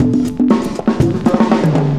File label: cooling 120, loops, 120bpm